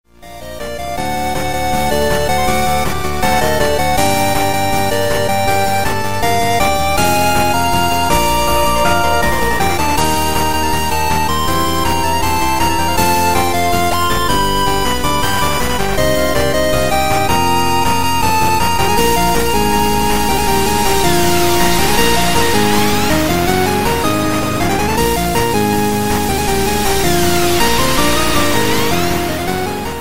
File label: 8bit; hunterxhunter